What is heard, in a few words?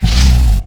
hurt; mahlendur